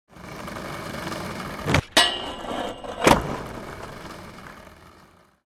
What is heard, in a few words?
Skateboard grind rail